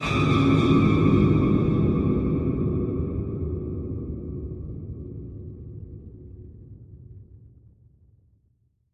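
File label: ambience spook event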